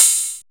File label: KPR-77, analog; Sound & Vision - Gigapack I